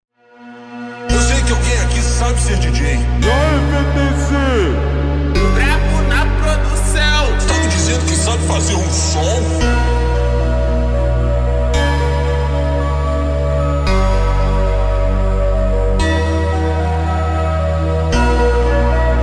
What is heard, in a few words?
instrumental slowed